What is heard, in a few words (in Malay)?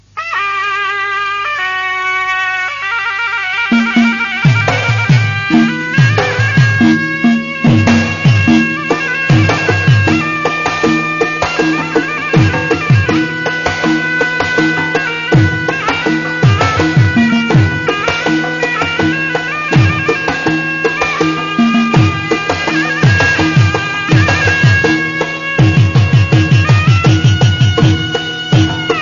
Lagu Silat